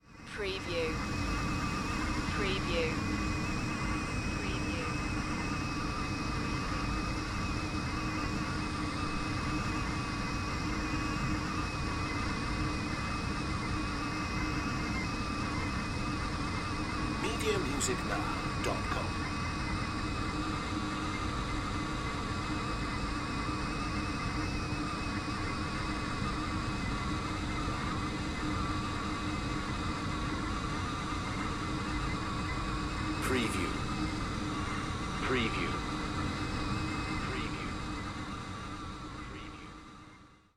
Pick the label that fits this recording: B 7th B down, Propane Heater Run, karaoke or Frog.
Propane Heater Run